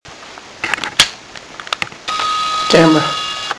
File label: Morgue camera